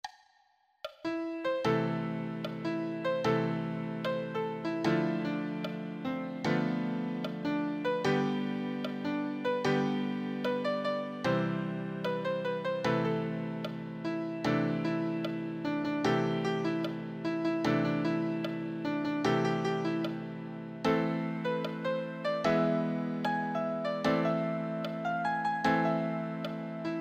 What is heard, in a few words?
easy solo, piano